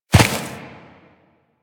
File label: Ram Step Run Grass; Animals; DE; Warframe; Quests; Duviri